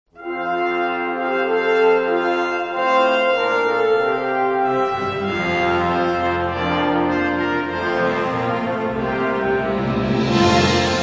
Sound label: Orchestra and Choir, Hymns